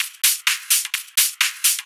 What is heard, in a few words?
Hihat Loop 128 BPM